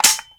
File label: AK74 Dry Fire; Warframe; Weapons